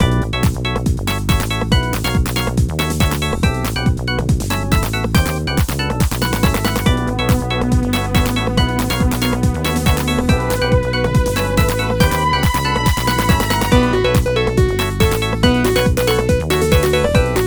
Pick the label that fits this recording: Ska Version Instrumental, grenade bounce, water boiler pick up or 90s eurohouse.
90s eurohouse